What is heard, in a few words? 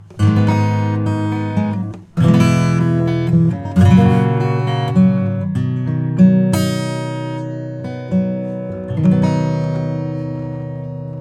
acoustic Elec Gtrs; MASSIVE; Andrea Lepori